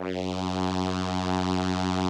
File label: SOLINA, String Machines